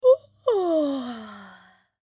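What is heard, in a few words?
Girl yawning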